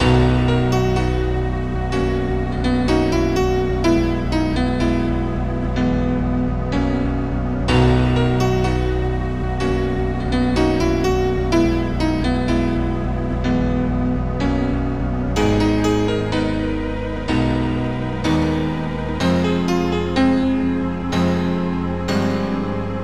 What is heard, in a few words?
PIANO